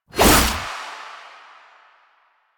Naginata Whoosh; Weapons; Melee; Warframe; Koumei Naginata

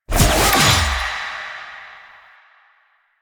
Choir Pool Cast; Warframe

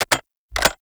Arch Gun Alt Charge Mech, Weapons; Warframe; Archwing; Hildryn

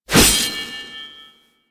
Hatchet Whoosh; Crp Venus Hatchet

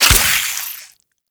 Infested Ashen Spike Fire; Warframe

